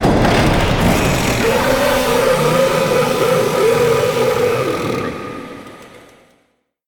spawn; chaniac

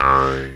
fat boing

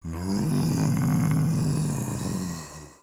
zombie grunt; zombies

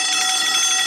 fire alarm

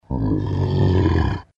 zombie low groan; mvm forever

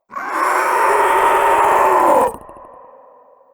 leaper dead; trespasser

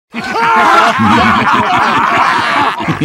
laughing

